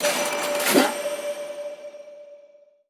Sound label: free Spin Start